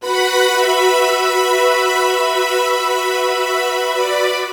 Synt; FUNKIN&GROOVIN'

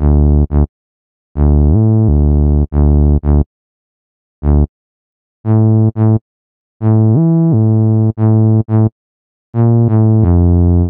88 BassLine; maudio; Hella Bumps

